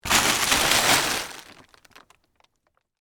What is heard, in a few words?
Plastic Tarp Up, Foley, Pick; Plastic Sound Effects; Plastic (Sound Effects:Materials); BlastWave